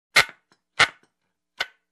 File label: Tightening loose gear screw